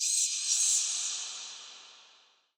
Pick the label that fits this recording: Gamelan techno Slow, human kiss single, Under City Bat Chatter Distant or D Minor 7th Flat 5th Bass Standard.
Under City Bat Chatter Distant